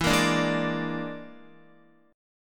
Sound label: E 7th Flat 5th, down, Guitar; E7b5; strummed